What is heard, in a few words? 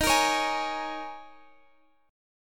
Eb 7th Suspended 4th Eb; x,x,13,15,14,16; strummed